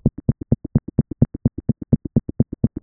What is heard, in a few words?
Cat Normal